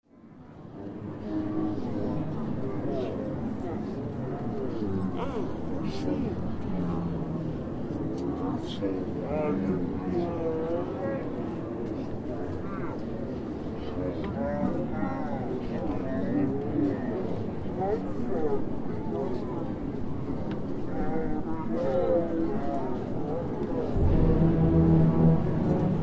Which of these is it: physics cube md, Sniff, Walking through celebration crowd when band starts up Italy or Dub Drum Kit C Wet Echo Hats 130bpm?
Walking through celebration crowd when band starts up Italy